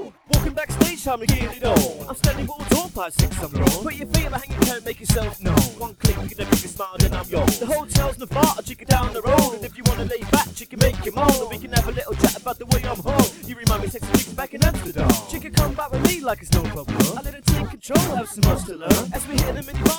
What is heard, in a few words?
percussive